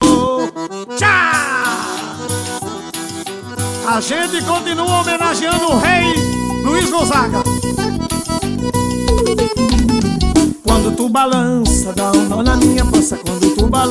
forró

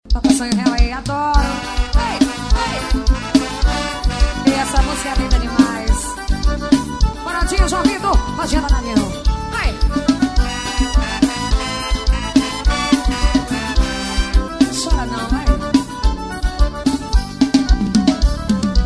forro

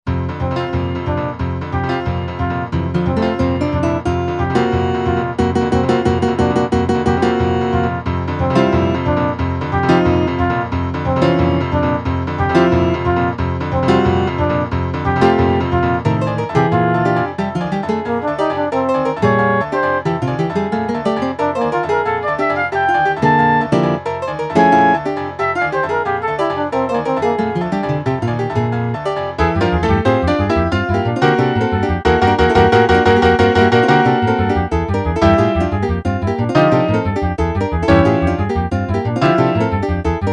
dissonancias; festival de inverno

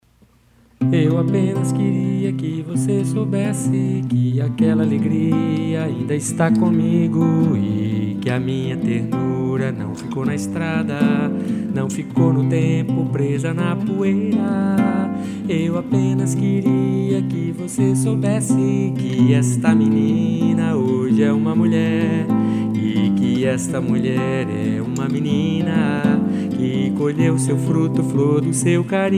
voz violao; tributo

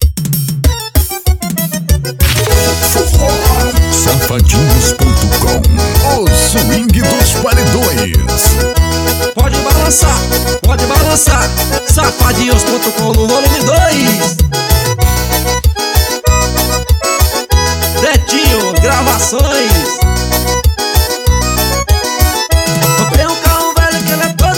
forró

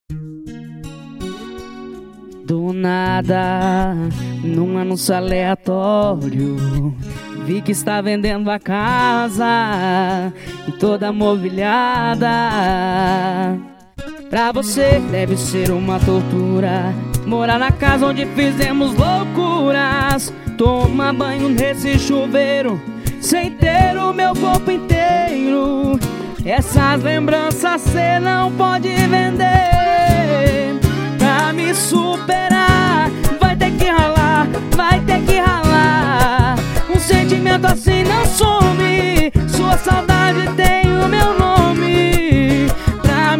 sertanejo universitário sofrência

